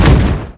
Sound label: damage hits explosions; World Of Warships